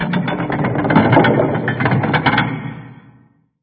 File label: door; zvuki dvery